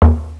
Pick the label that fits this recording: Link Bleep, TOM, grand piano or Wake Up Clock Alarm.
TOM